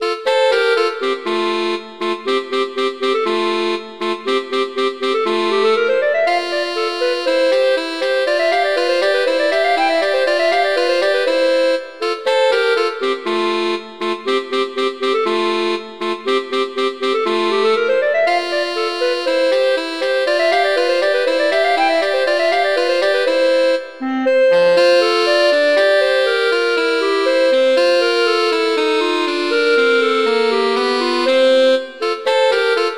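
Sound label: Duet, horn; Mozart; Duets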